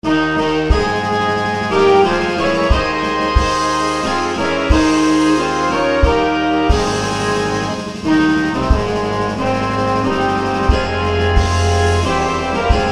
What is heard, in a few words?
Star Spangled Banner; by Francis Scott Key